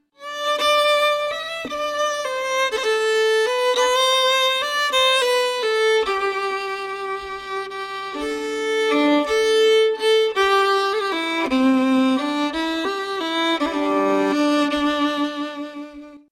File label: Instrument